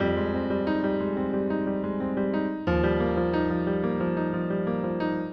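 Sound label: piano reflective vamp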